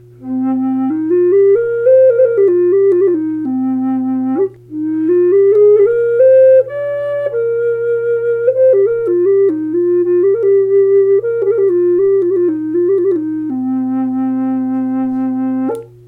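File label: BRANCH C4, Native Style Flute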